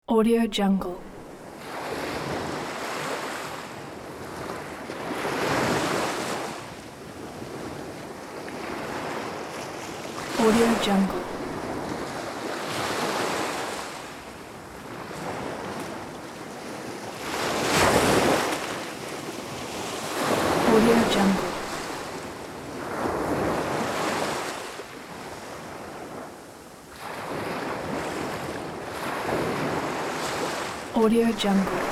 ocean waves

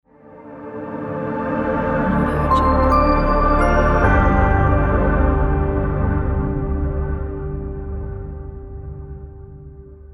magical swell logo reveal

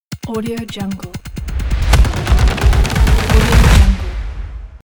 short action logo

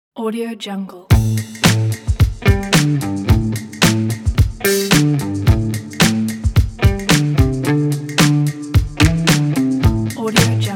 this funky groove background

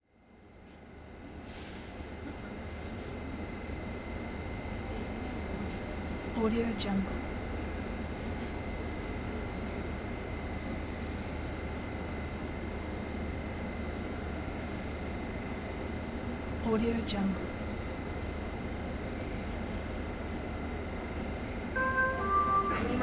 metro train interior with announcement; west-wolf

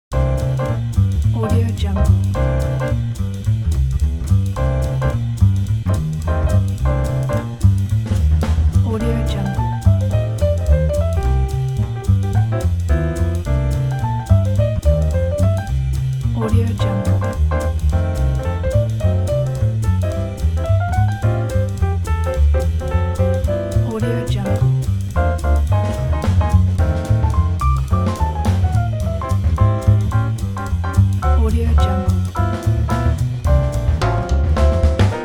walking jazz piano swing; imusi

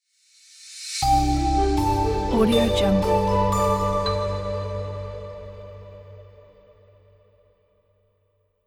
crystal logo

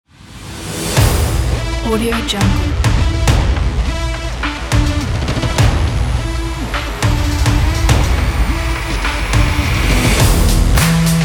extreme sport intro ident logo

